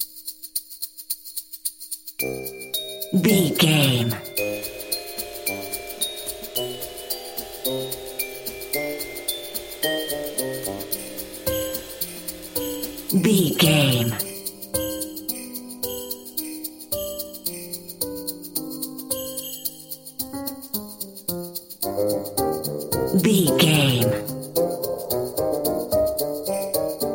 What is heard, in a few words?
Too Clever, silly, circus, goofy, comical, innocent, naive, child like, childish; Sitcom; Dramedy